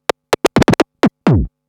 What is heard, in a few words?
Scratch Fills 140BPM